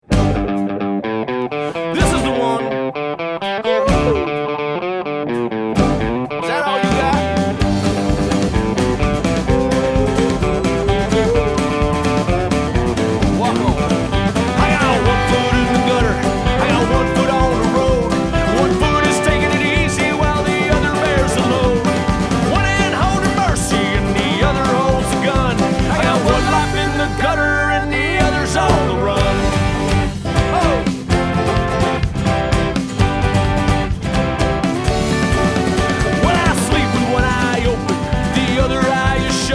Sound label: Gutter Banjo